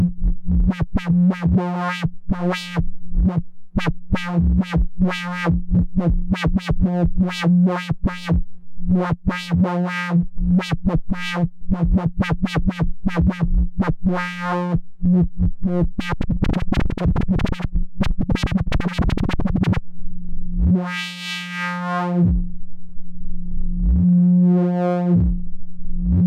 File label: peaks chaos lfo mods sprott, synth